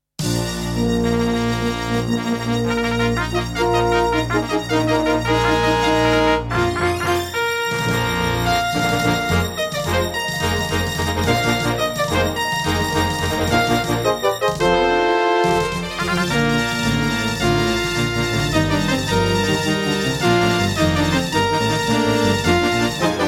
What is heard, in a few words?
Intro, Yamaha TG100; Dark Forces